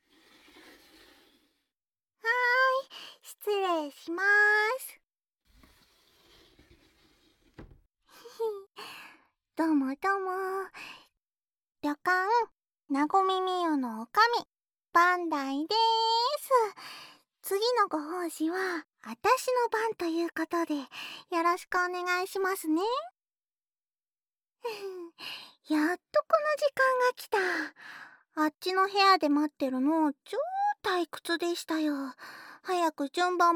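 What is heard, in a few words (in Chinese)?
直到耳朵深处为止，都要满满地用上唾液开始舔了哦？（舔耳，右耳）; 舔耳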